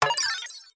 negative or wrong item touch sound fx arcade casino kids mobile app